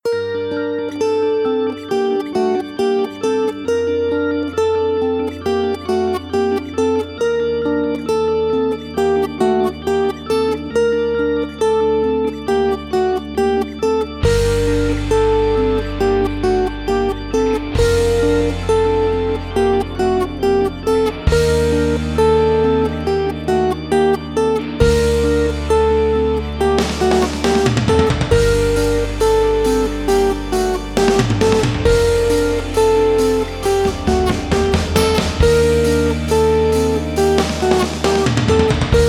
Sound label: guitar tutti; guitar sheet music; the most beautiful french songs for guitar easy